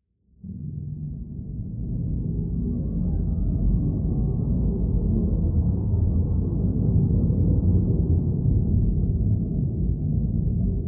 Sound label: Dark Orch Pad